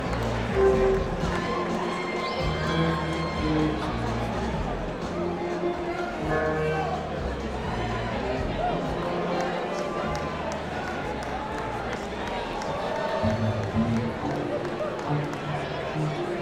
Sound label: tuning crowd; mkh8040